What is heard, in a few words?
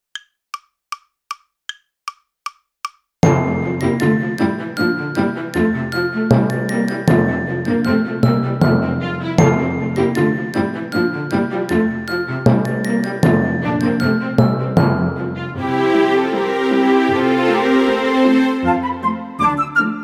Deck The Halls Playalong slow